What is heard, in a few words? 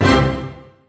ORCHESTRA HIT; drum machines